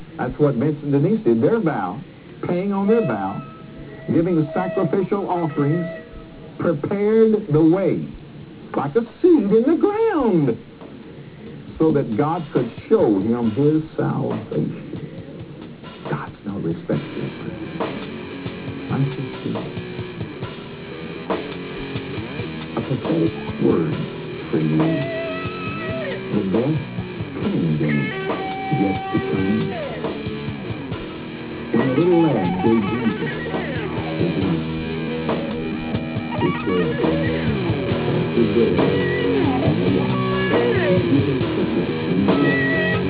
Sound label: pigs, riffs